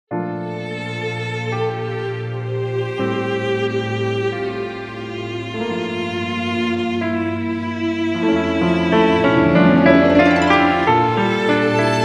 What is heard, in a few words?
F play flute reference